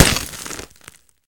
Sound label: concrete, impact bullets; hl2